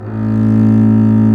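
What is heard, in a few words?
STRINGS, Cb Bowed f; Roland L-CD702; Cb2 f vb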